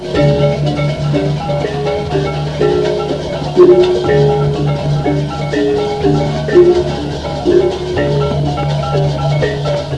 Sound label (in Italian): suonerie musica ritmica